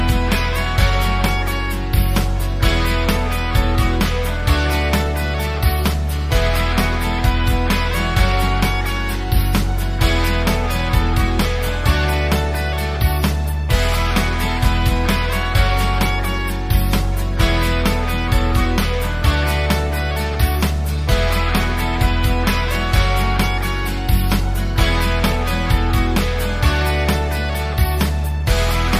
Country Tune

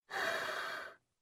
Blowing

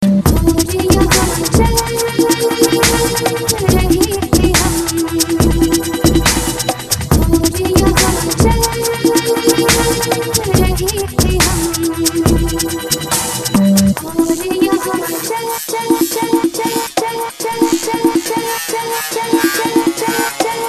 Eastern Jam

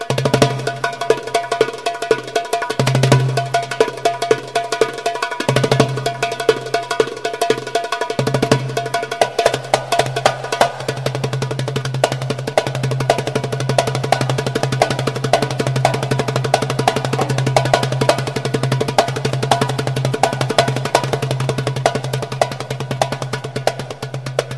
Darbuka

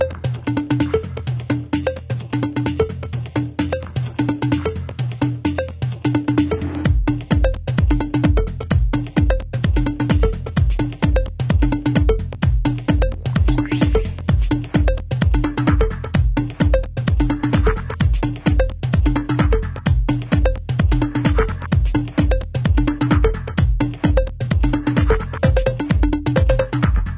Techno Tribal

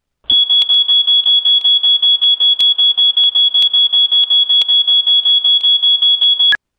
Alarm Clock

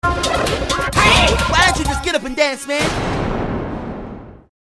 Game Over

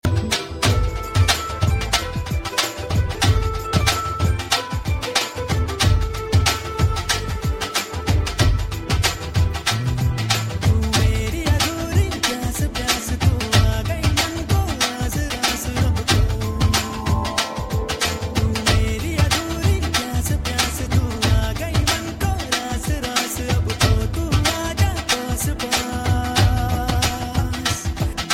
Punjabi Mix

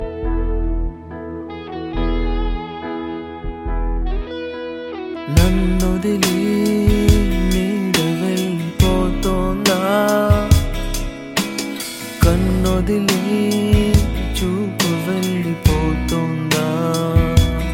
Romantic Song

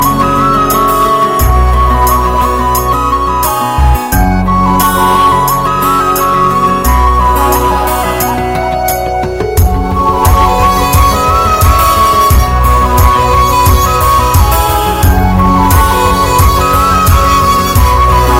Sweet Flute

